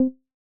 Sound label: menu back; Luxariah Default Minimal